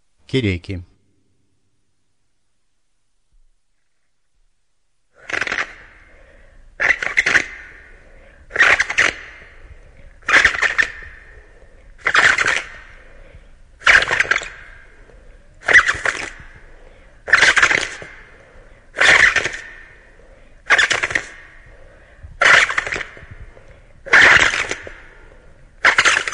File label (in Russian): Кереки. Наигрыш на халхасинхайнанъан чаячье перо керекский свободный аэрофон